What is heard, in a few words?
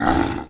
hurt, vindication illager; PureBDcraft; minecraft